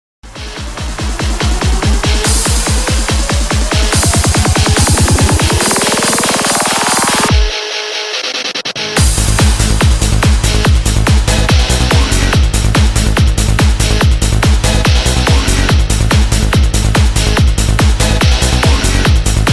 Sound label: Goa Trance